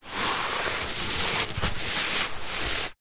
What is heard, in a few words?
sport exercise mat foam hand rub